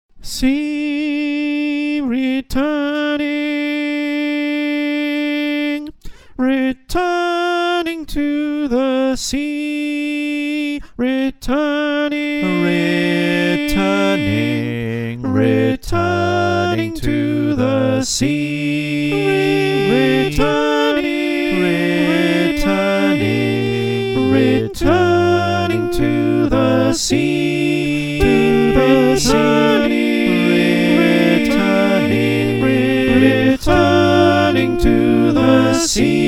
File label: SATB